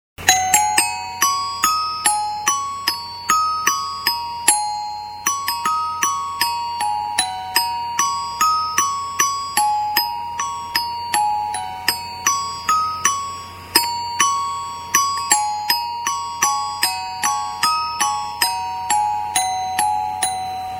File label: haiku chimes